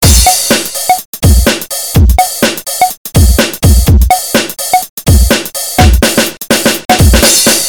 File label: depressing rock music